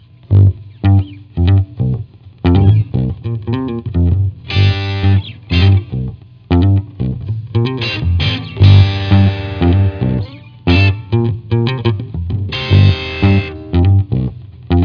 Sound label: bass and guitar jam